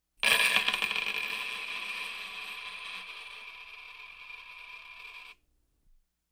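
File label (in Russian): высыпают монета долго крутится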